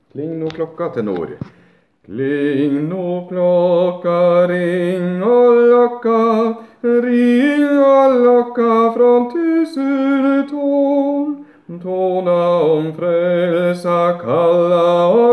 tenor; Kling no klokka

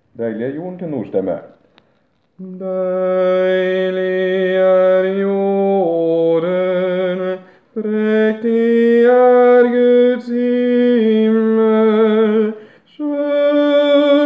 Deilig er jorden - tenor

